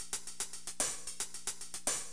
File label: rave Gourd; SGI O2 Out of Box Experience; jungle Island; Cyber Gourds